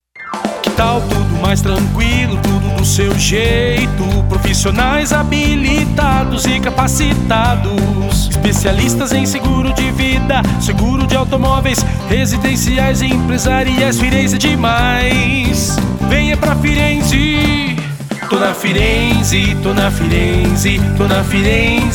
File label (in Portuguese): Jingle; Firenze Corretora